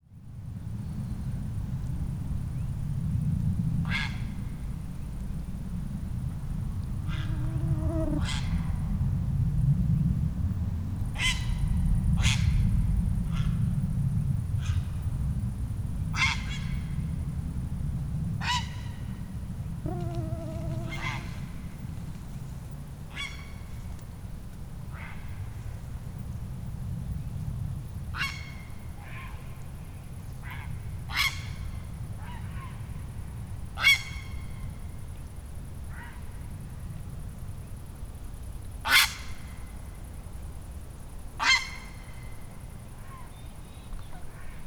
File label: Ardea cinerea